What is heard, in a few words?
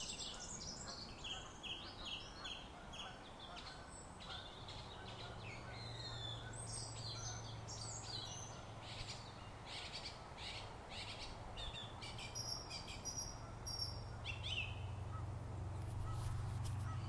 birds out in force